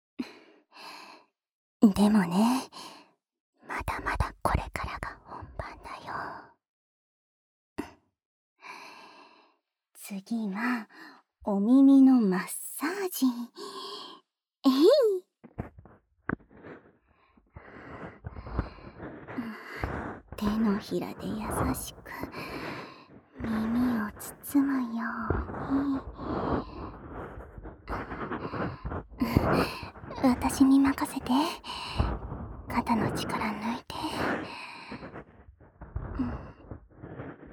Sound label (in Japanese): どきどきスク耳マッサージ; えろごろ